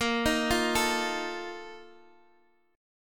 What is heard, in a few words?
A sharp Major 7th Suspended 4th, down, Guitar, Standard; arpeggiated; JGuitar